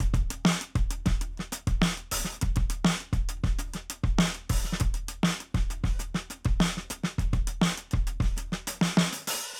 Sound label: Cakepop 100 bpm, drums; Basement drums vol 1